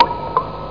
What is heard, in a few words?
DRIP